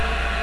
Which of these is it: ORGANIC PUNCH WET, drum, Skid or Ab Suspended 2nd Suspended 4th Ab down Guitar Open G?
Skid